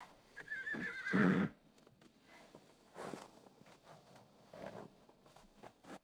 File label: soft horse nicker vocalization; animals